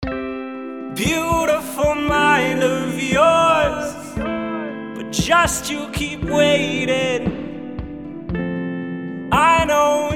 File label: Post Mix